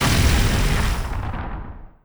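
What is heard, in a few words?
Laser Hit Exterior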